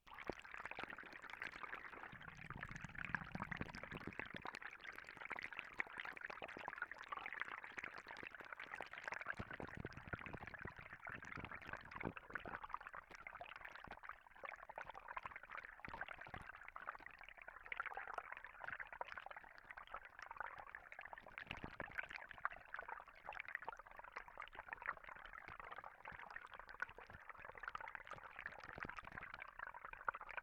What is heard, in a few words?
Underwater